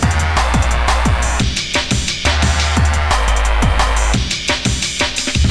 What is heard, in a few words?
music